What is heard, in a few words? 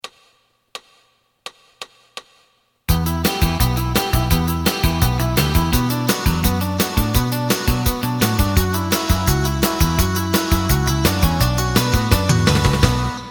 lead